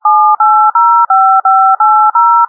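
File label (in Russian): набор номера на кнопочном телефоне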